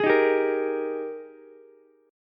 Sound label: Ab Major 7th Suspended 2nd Ab Ukulele; strummed; JGuitar